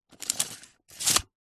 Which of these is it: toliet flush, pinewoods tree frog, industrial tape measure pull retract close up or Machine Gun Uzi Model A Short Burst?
industrial tape measure pull retract close up